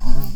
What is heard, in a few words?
Wasp